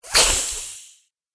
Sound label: Vampire Hiss; Monster Sound Effects; Horror Sound Effect